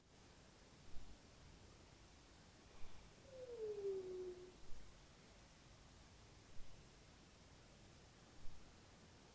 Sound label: Piewwwouu, Night Noise